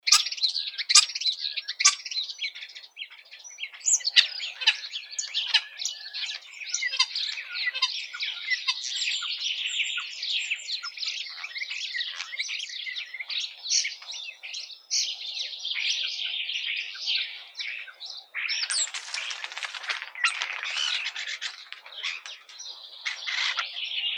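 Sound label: starling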